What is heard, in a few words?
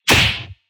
Slap, snare